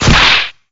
lights on laugh

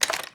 pull hacking gun; komaru naegi

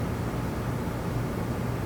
indoors, ambience

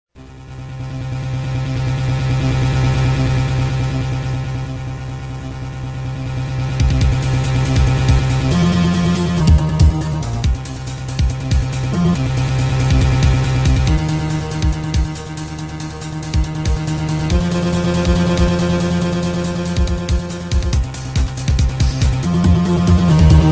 suspense

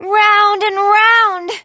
spin

